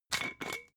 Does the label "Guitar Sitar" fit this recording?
No